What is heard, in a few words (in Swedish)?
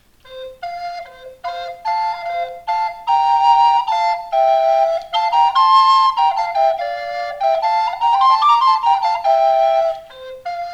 fanfar